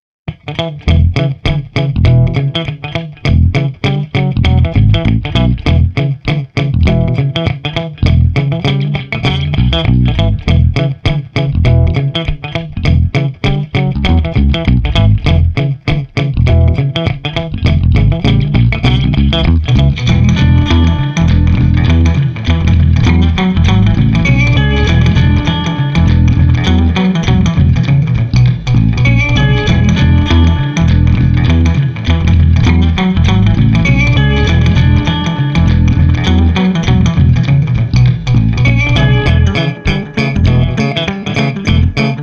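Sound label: Street Guitar Bass Downtown Shuffle, 100 bpm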